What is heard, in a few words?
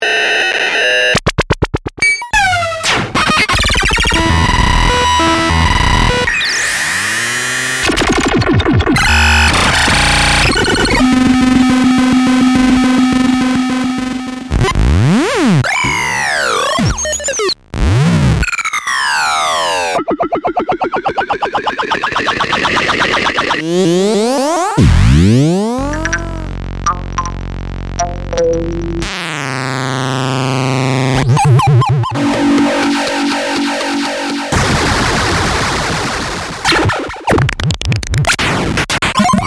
Parallax SFX